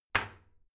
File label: toilet seat close soft, badezimmer; haus-garten